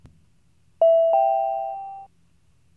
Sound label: chime